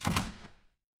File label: door open